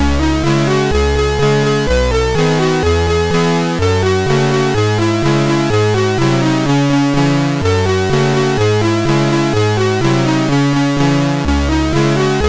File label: Little Bird, sid MOS6581R3